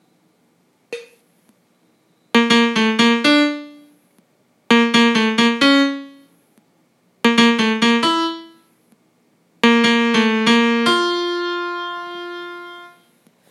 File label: melody